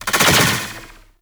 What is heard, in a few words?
roulette activate; Cowgirl